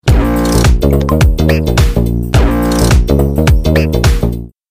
Vivo

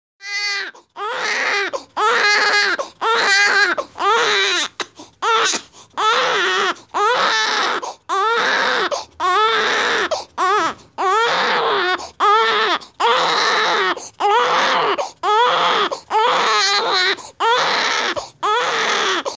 Cry Baby Ring

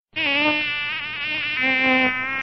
Mosquito

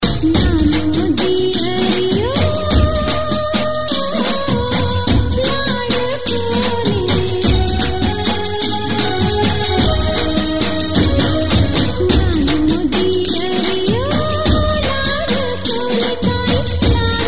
Gujarati Ringtone

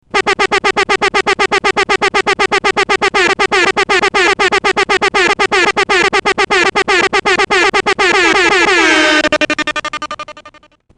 Horn Mix

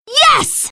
Win

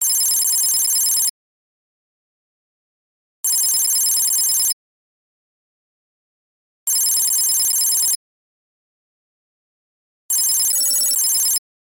Cellphone Unlock

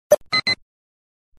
Ring; The Unit

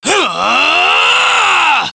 Huhh-aaaargh

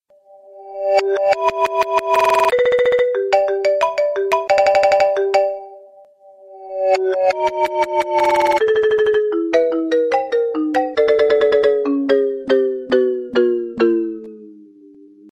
MARIMBA

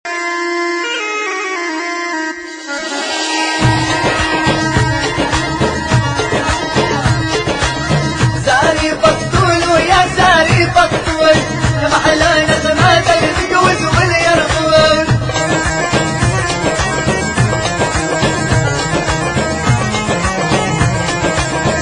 Debka

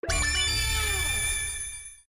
Level Up

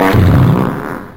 Funny Fart

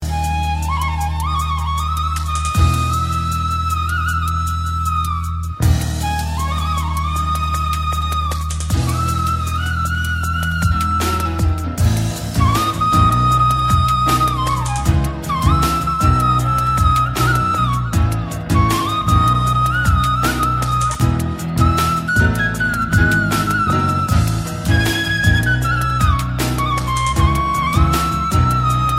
Sad Flute

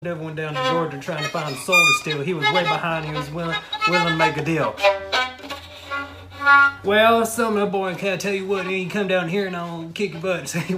Violin

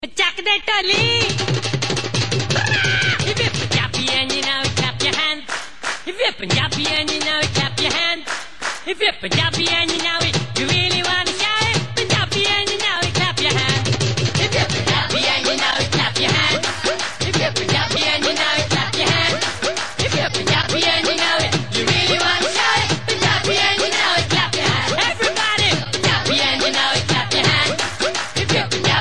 Punjabi

